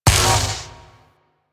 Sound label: Vial Magnetic Shatter; Warframe; Alchemist